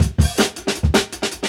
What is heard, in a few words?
160, Jungle Breaks; Original Jungle Breaks